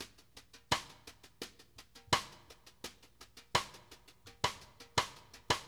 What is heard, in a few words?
BRUSH, BALLAD 84; Sampleheads; New York City Drumworks VOL-1; SP BALLAD 84